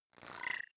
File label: bubble fizz appear, swooshes swishes